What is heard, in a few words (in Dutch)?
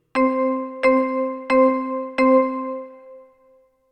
ritme